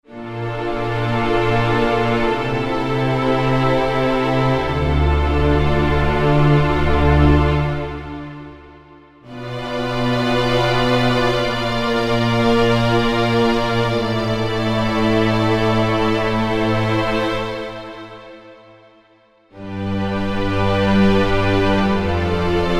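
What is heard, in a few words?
Strings Swell String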